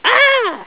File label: aaauh